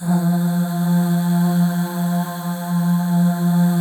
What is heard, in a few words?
Voices, FEM AHS; Spectrasonics; FEM.2 AHS